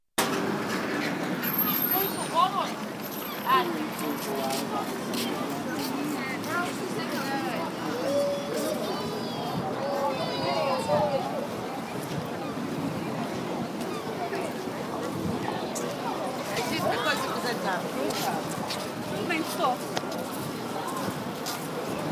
Scarborough seafront soundscape; vacation

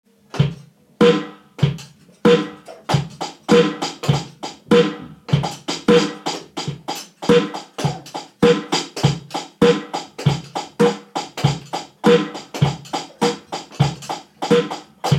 live drum set

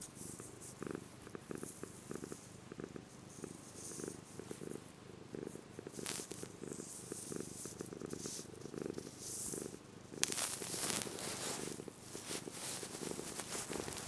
purr kitty

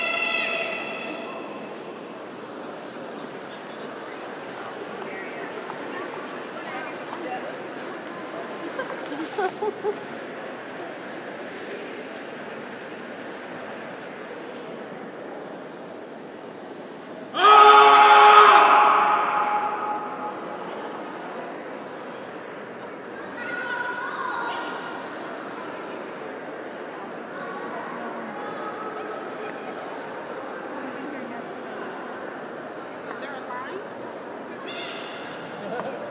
voice piece for soprano; moma

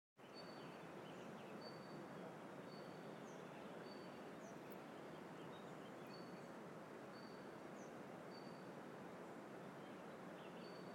spring sound

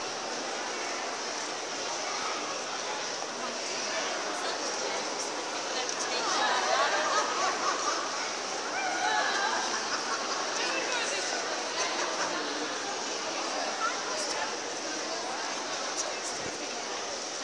ambient noise at meadowhall possibly poor quality

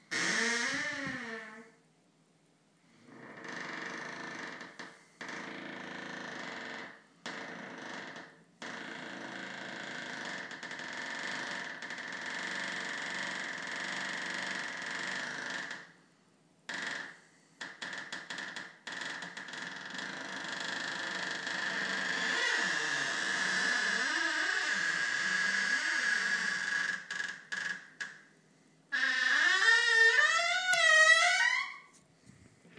creeky door

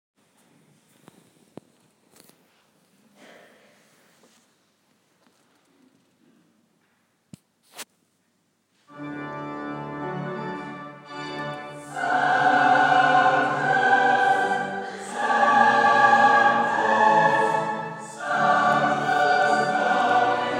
sanctus combined choirs

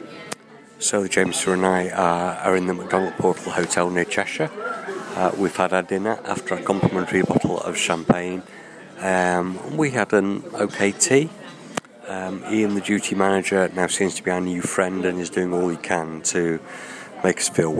drunken saturday evening

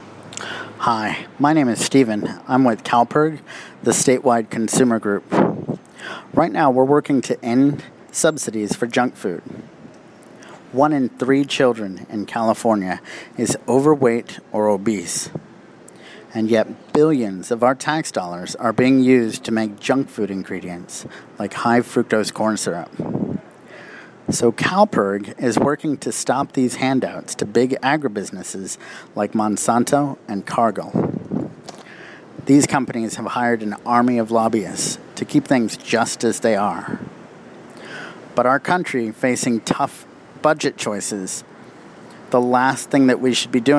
rap

